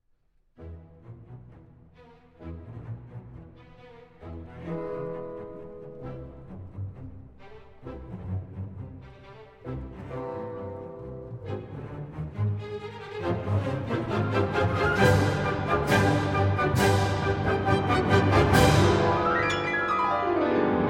Piano Concerto No. 2 in C Minor, III. Allegro scherzando